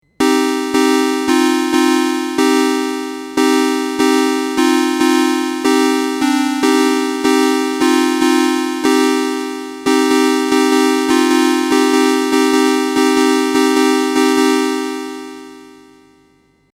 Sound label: JU-06A Puls PWM Chords